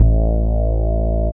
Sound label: DR. LOOP, BASS; X-Static Goldmine 3